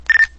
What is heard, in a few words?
nextel chirp ringtone